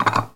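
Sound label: clatter of dishes in a dishwasher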